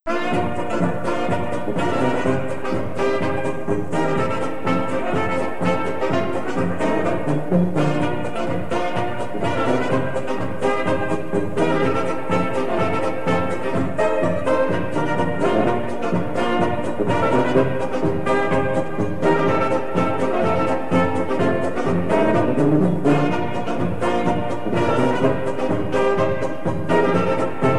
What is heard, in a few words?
Polka